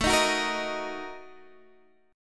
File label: Bb Major 7th Suspended 4th Sharp 5th, guitar